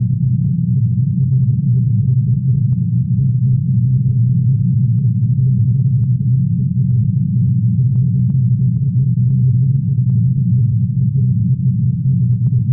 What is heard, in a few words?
atmos